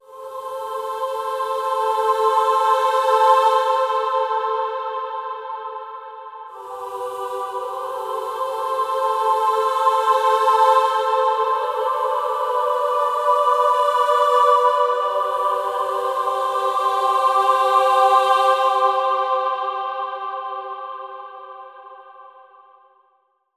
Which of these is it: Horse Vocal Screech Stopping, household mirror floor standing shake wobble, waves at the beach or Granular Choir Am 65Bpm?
Granular Choir Am 65Bpm